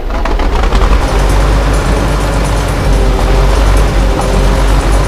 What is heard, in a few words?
crane turn loop, vehicles; Source Engine; video game